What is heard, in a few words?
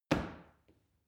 paw kick soft